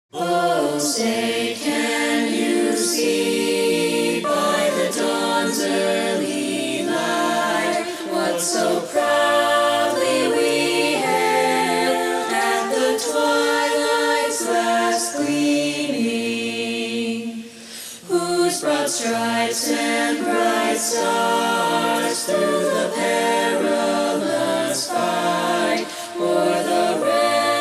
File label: learning track alto; Star Spangled Banner 2020